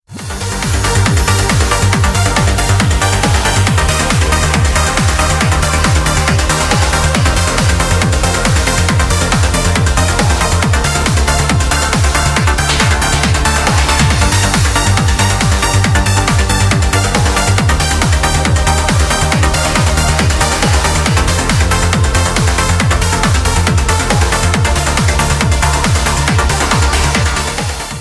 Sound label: beautiful trance, ringtones